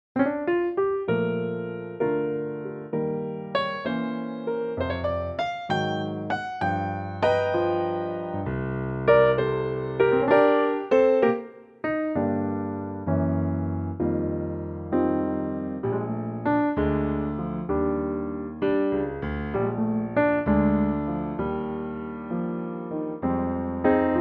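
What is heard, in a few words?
Bb Piano, male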